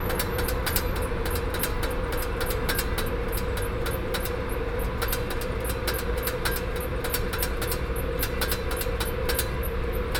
air conditioner irish pub; sergiyev posad

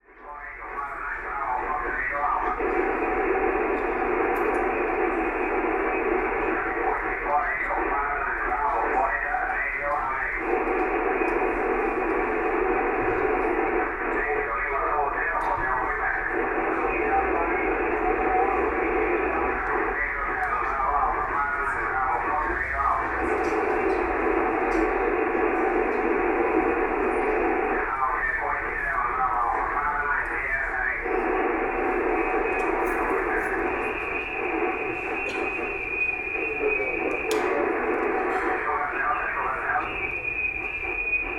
radio club, sergiyev posad; skobyanoy area

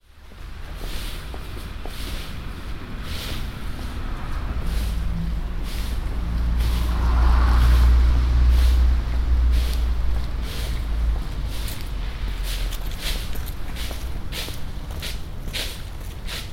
autumn leaves, Sergiyev Posad

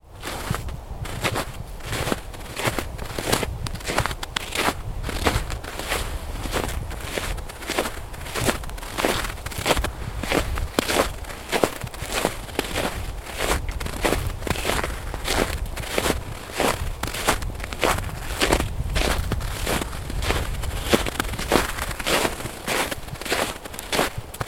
lake steps; lesnoe

